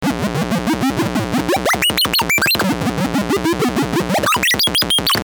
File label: bleep tone